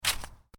Footstep snow shoe, Shoes: Individual Footstep On Snow, Light; Human; Stuart Duffield